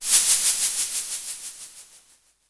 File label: Perc Hit, Noise